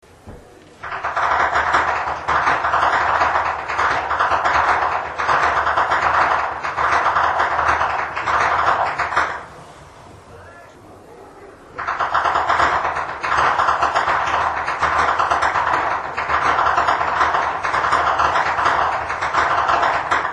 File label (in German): schnalzen, flachgau; anthering